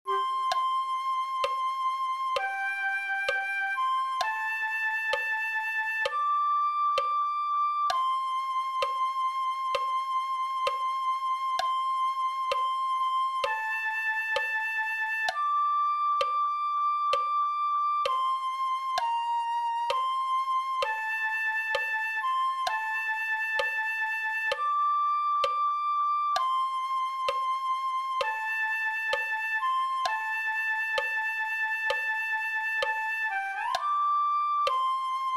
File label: flute 1